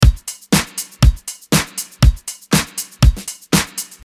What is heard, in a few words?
nu disco